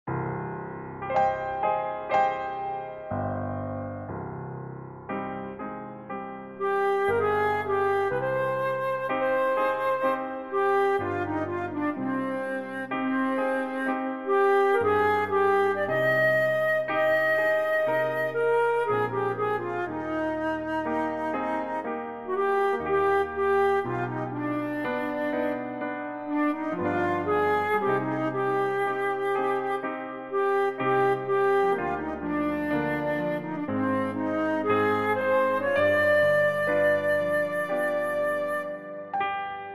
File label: flute